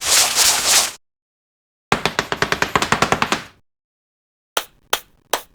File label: board